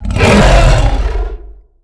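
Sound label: rancor attack; Star Wars Knights Of The Old Republic I; XBOX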